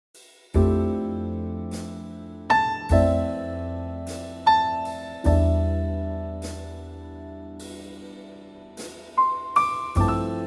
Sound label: trio C; vocal range - B to D